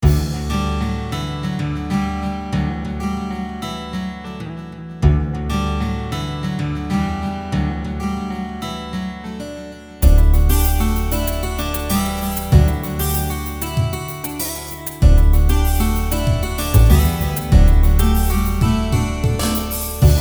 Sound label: MUSIC COUNTRY